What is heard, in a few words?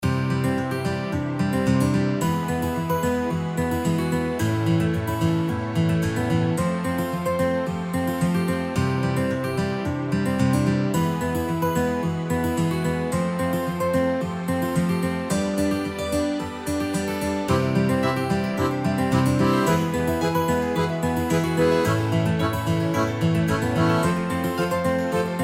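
farm outro background music; Farm Mania; Happy Farm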